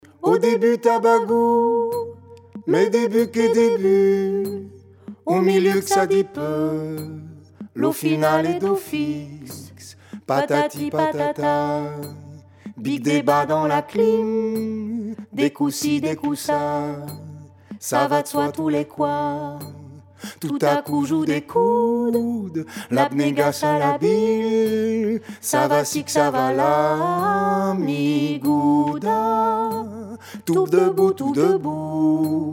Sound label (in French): BARITON MEZZO